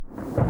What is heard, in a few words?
Music Box